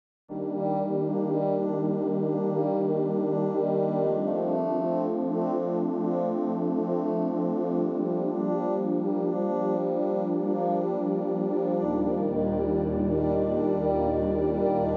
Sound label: Slow Rain ohne